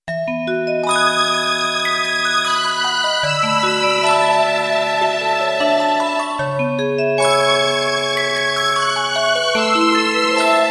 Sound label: dungeon